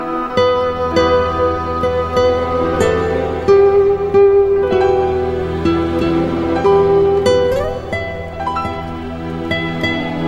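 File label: igra na gitare, guitar playing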